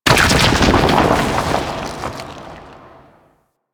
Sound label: Rock Fall Medium; Ambience; Orokin Moon; Warframe